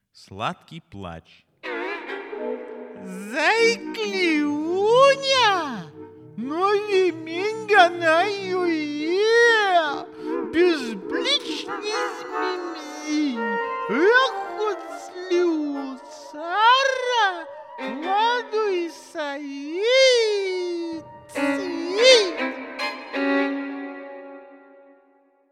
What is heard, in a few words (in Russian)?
plach